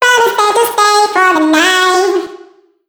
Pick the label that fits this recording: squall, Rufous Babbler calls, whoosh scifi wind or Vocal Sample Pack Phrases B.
Vocal Sample Pack Phrases B